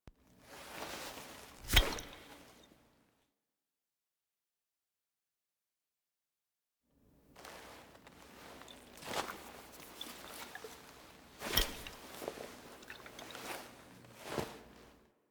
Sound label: Operator Foley Give; The War Within; Teshin